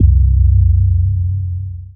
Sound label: Single Electric Basses, Dance Floor Bass